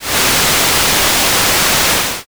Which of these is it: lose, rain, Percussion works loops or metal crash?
rain